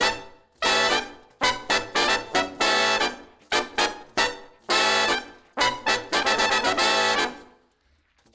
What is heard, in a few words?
Horns Section